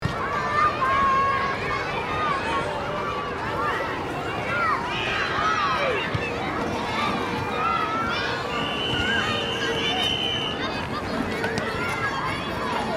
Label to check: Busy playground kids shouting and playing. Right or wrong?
Right